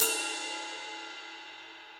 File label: Ride Mid Session Dry; Drums; Drum Hits; Standard Hits; Ableton; Session Drums Studio